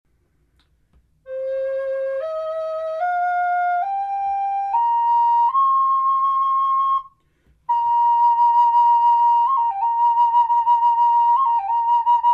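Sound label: natural, wood flute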